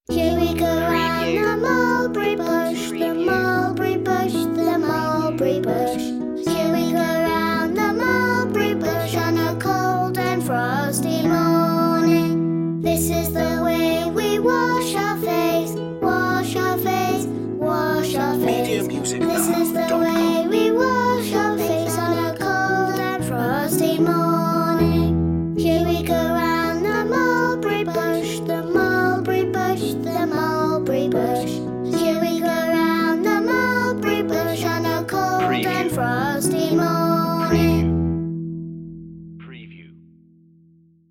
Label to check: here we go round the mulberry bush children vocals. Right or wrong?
Right